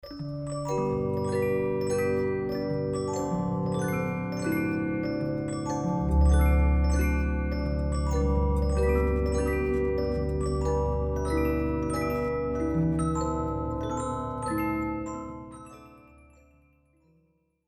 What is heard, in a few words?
cloister bells